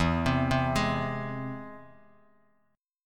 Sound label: E Augmented, Bass Standard; E+ arpeggiated; JGuitar